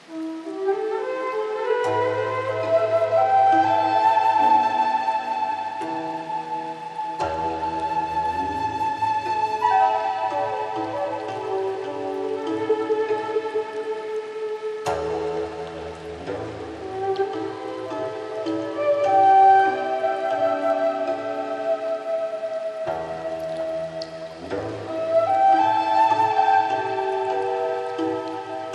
Chinese Meditation

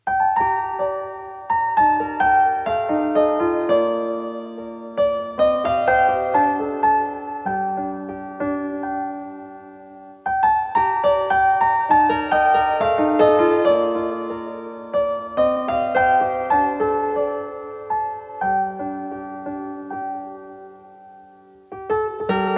piano music, 432 hz

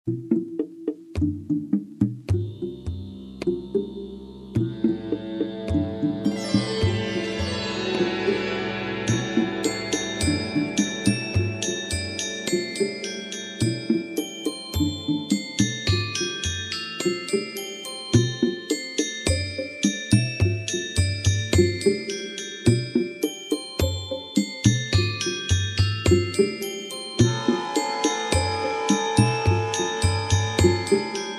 ambient with nature

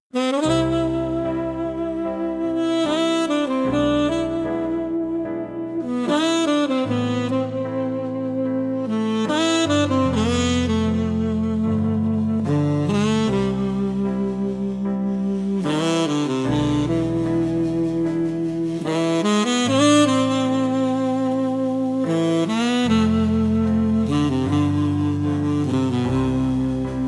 golden sax vibes

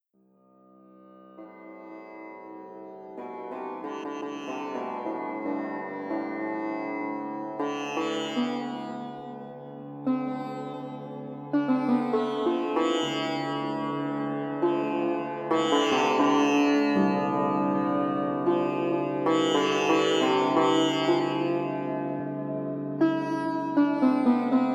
flute stories, 432 Hz; father universe

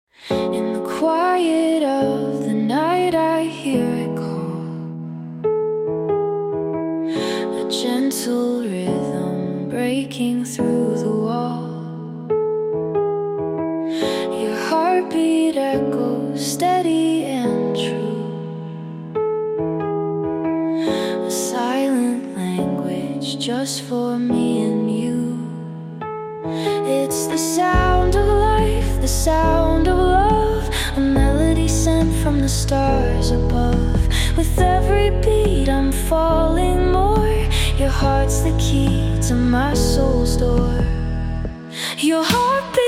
Heartbeat Symphony; pulse of solitude

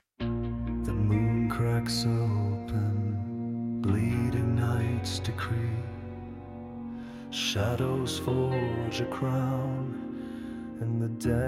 Metal Ballad; iron dreams

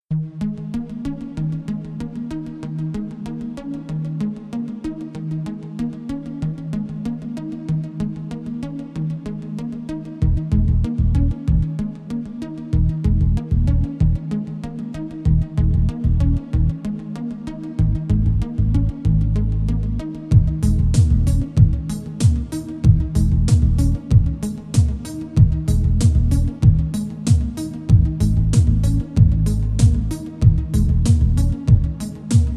vocally 432 hz

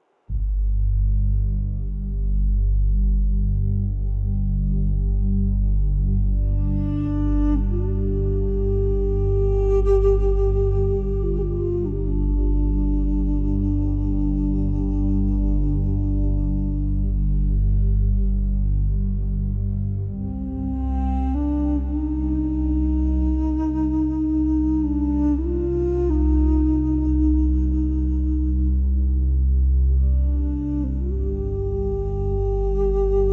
Whispering Winds, instrumentalnej muz

